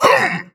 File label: Grunt Jump; paladins; Drogoz